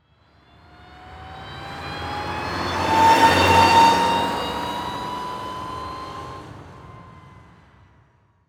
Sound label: Transportation Cars Honda Civic Drive By Slow In Reverse